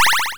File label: power Up